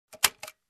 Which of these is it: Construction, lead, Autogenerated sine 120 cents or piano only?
Construction